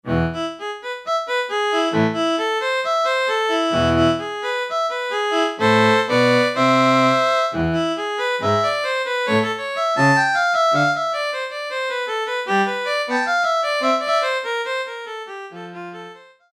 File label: VIOLIN, Yamaha PSR-300; Voices